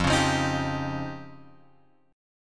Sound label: F 7th Suspended 2nd Sharp 5th